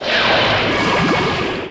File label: Pickup Underwater O2 Tank; Pickups